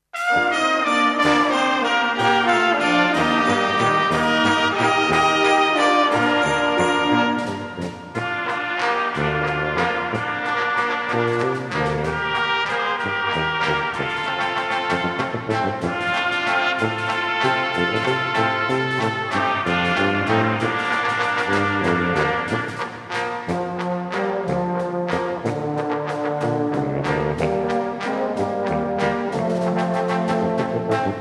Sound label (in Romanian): Fanfara